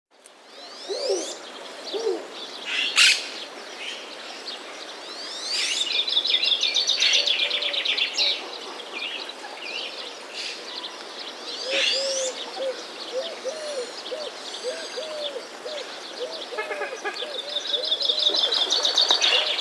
Ambiance Nature Birds Water; Outdoors

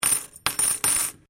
Coin Penny Multiple Drop Wood; Common coins; Money; Objects

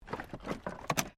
Wood Movement Minor

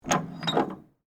Handle Squeak, Handle, Squeak, Metal; Foley; Hand Tools; BlastWave

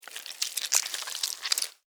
Worm, Crawl, Movement, Slimy, Wet, Insect, Bug; Blastwave; Animals:Insects

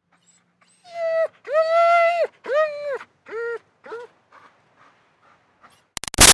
Dog German Shepherd Whine Pleading; Dogs; Animals

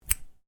Scissors snip, Scissors: Short Hard Snip; Household; Miscellaneous Household

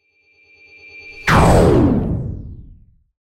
Feedback To Hit; Feedback Sound Effects; Sound Design; Blast Wave

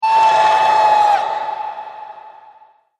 Scream Vampire, Monster Sound Effects; horror sound effects

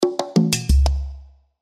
Percussion Ethnic; Percussion Ethnic Rhythm Fill; Hit Sound Effects; Blastwave

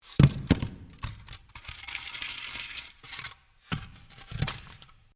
Stone On Stone Large Impact; Material components for constructing more complex sounds; Drops; Objects

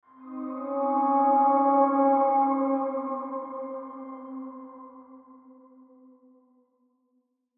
Horror Swell Descend Airy; Scary Tones; Blastwave; Sound Effects:Horror

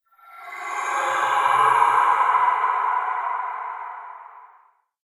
Science Fiction Laser Illusion; Sci-Fi; Andrew Fleming

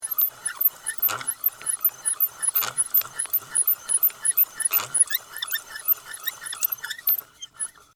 Foley, Handle, Metal, Device; Miscellaneous Electronic

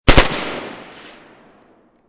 AK47 2 Burst Field; Machine Gun; Machine Guns; VRSonic

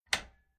Household Door Old Spherical Latch Opening Slowly; Tom Hutchings

